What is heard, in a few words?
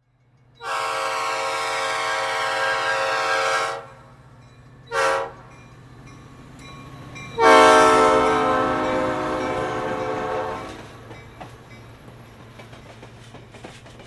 Train Crossing